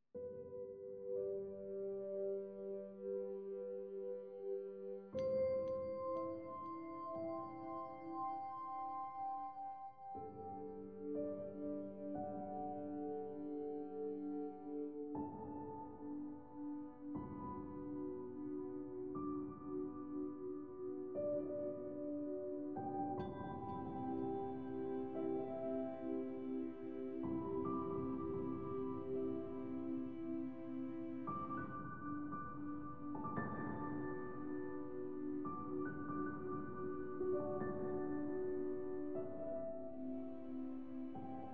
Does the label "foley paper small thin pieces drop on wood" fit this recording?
No